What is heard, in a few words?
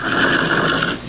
whinny